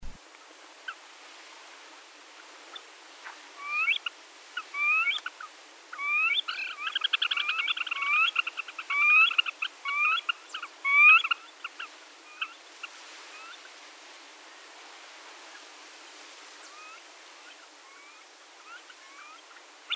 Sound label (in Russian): chernosheynaya poganka golos